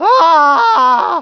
scream; svencoop; hunger; thambs

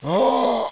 pain; svencoop; hunger; hgrunt

